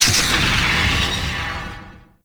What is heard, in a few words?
Corpus Ship Servo Mech; Warframe; Corpus Ship Remaster